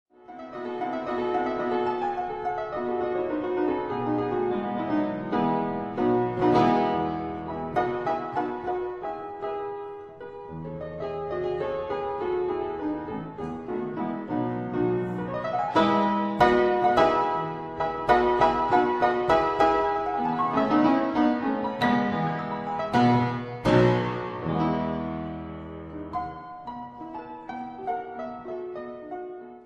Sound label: Keyboard Sonata in E major, Rondo Allegretto Scherzando; Amicitia; Op. 69